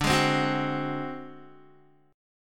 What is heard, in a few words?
D 7th Flat 5th D, Guitar; D7b5 strummed; JGuitar